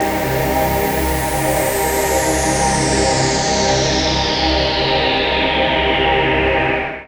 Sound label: VOICE PAD, ATMO PADS; Best Service; XX Large